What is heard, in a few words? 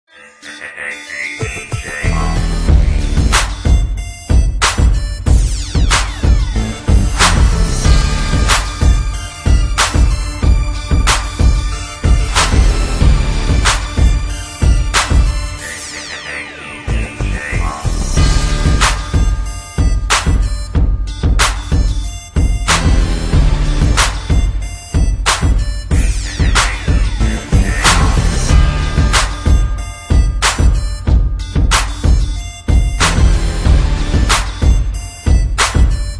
hip hop rap beat instrumental